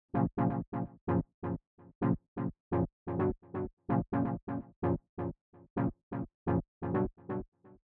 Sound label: Parallel motion half step; 什么是平行和弦？